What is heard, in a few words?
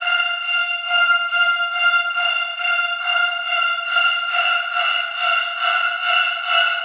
Riddim Synth Loop F (140); Ghosthack; Riddim Essentials 2020